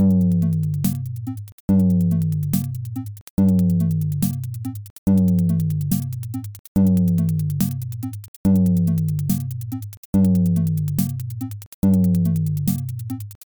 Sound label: beat cocek